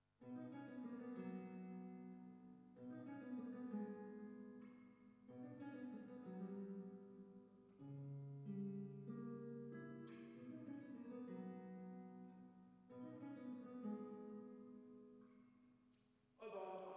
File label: Spring